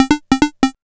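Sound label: synth sequence; E-Lab; X Static Goldmine 1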